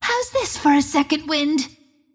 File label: attack pain; Windrunner